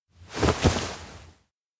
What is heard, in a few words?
blindfold; makoto; anemoi パッケージ版